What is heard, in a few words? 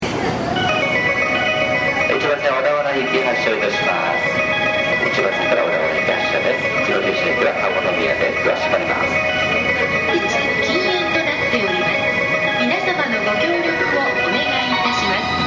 hassya; kozu